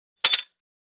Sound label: Single Dead Sword Hit